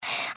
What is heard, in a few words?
breath; pony; Twilight Sparkle